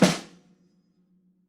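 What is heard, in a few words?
snare fortississimo, marching; Struck Membranophones; Legacy Snares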